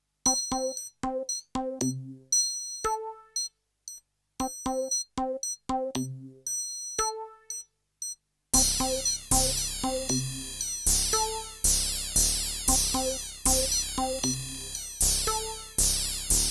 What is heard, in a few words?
computer